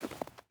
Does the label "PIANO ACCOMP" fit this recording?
No